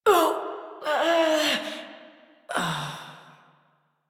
Death Adult; New War; Adult Operator

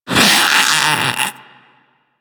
Infested Splitter Split Top Vocal Alerted; Warframe; Grey Strain

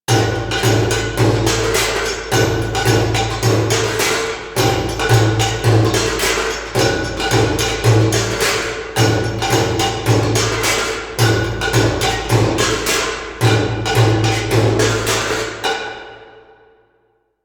Music Exterior, Ambience; Vent Kids Skeg; Warframe; Venus Open World; Venus Solaris United

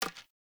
Hunter Bow Grab; Weapons; Player; Bows; Envoy Hunter Bow Ranged Melee

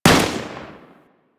Deth Cube Fire 3D, weapons; Warframe; Sentinel; Deth Machine Rifle

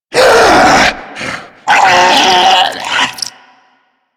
Transmission A; Warframe; Infested

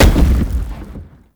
Blunt Heavy Impact Vegetation; Weapon Impacts; Player; Environment

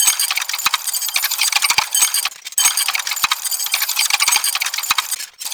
Shrine Idle Loop; Duviri Medusa Puzzle

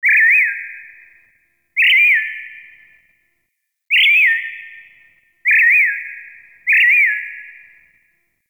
Terrarium Bird Chirp E; Warframe; Ambience; Entrati; IntTerrarium

